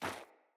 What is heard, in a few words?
Sandals Run Snow, Footsteps